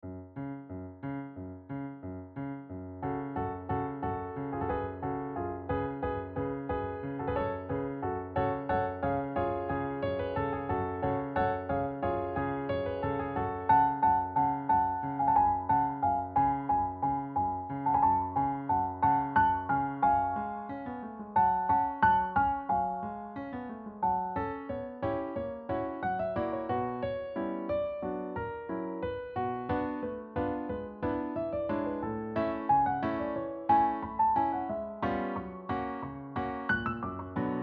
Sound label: piano; Schwanensee; grundschule musik